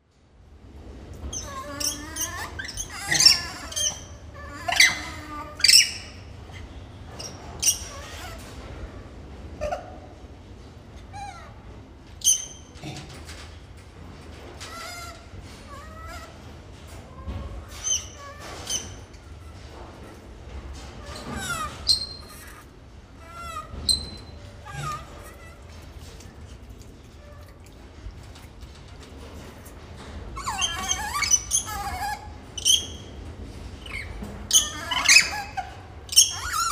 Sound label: rhesus mother w baby; Rhesus Macaque mother and infant vocalizing